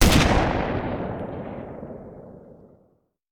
Rifle; Sound Ideas; Guns

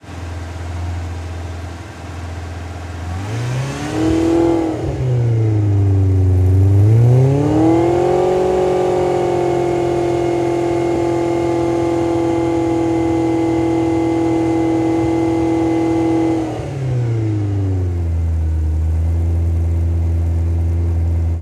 Ferrari 355 Fast Idle; Automobiles

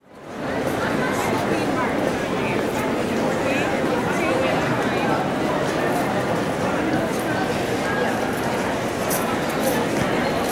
Station Busy Train, Walla; Sound Ideas; Walla Series

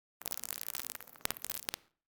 Electric Static Sparks; Hollywood Edge; sound designer's toolkit v2

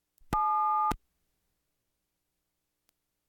Household Phones

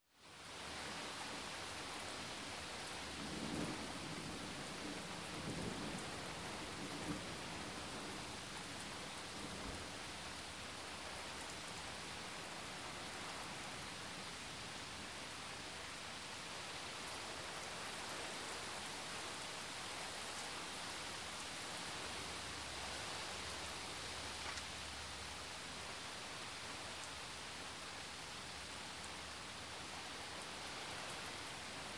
Rain, Medium, On Pavement, Thunder; Sound Ideas 2000 Series

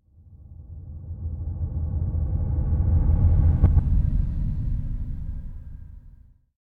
Drone Low Deep Oncoming; Hollywood Edge; sound designer's toolkit v2

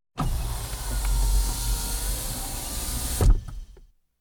Automobile Window Opening Electrically; KMP Film Video Sound Effects

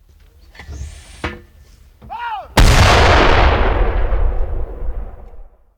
Cannon, Load, Voice Command And Fire; gun and weapon sounds; captain audio; fully loaded

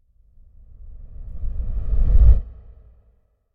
Whoosh Reversed Sub Air; Hollywood Edge; sound designer's toolkit v2

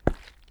boots wet hard; Apotheorasis

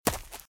Footsteps - Leaves - Run - Right; RadwinIsland

